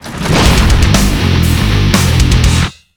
GAME RINGTONE; CONTRACT COMPLETE; CALL OF DUTY BLACK OPS

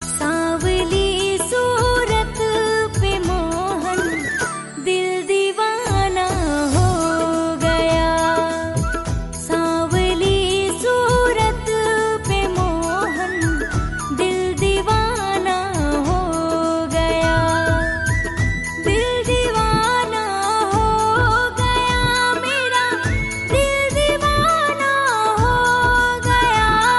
FEMALE RINGTONE; BHAKTI

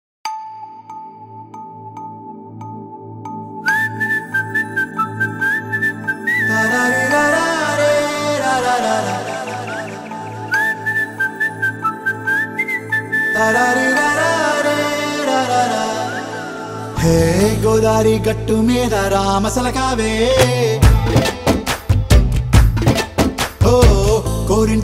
RINGTONE, TELUGU SONG

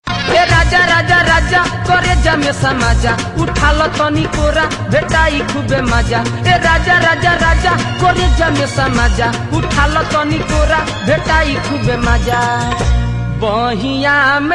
BHOJPURI RINGTONE

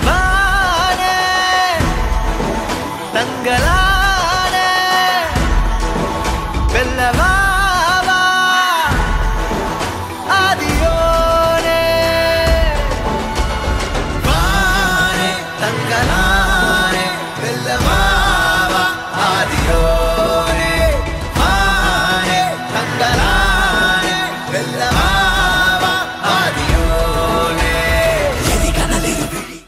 WAR SONG RINGTONE, TAMIL; THANGALAAN

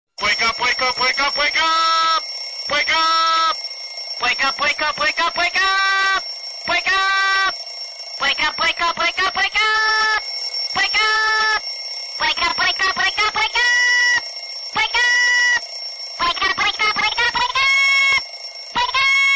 WAKE UP ALARME ALARM RINGTONE

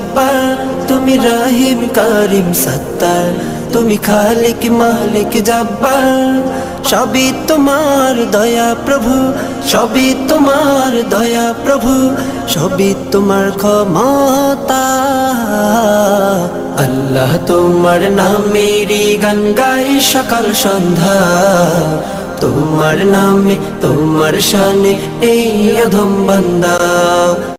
BEAUTIFUL ISLAMIK GOJOL RINGTONE

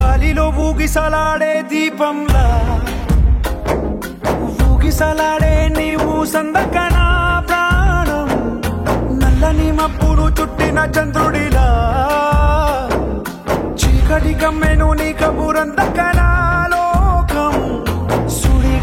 TELUGU SONG RINGTONE; THANDEL

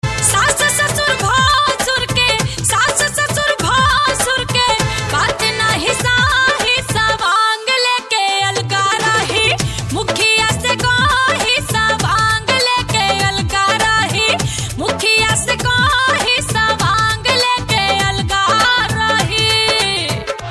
BHOJPURI RINGTONE

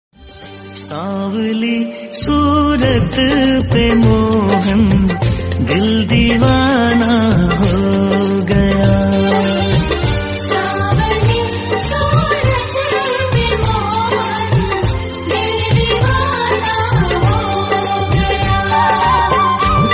DEVOTIONAL HINDI RINGTONE

